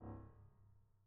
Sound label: harm C1, Grand Piano; bitKlavier Grand; Piano Bar